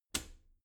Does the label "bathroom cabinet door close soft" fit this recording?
Yes